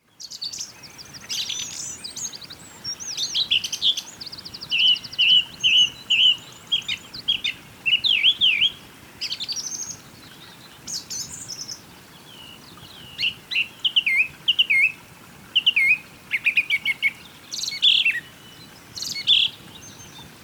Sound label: birds singing small river